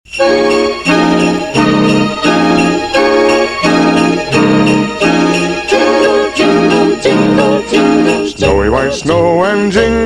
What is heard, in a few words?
Orchestra